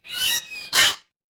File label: cartoon squeak